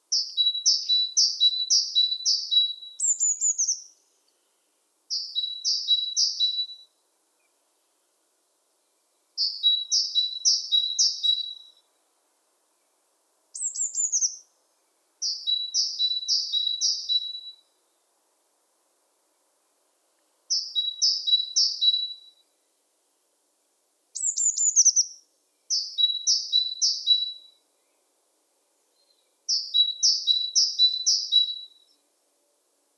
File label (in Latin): Parus major; SETE